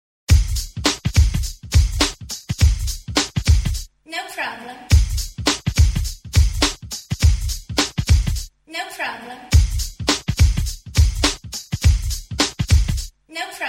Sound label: Karaoke